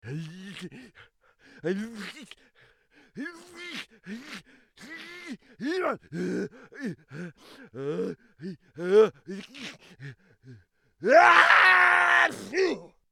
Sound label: Sneeze Cartoon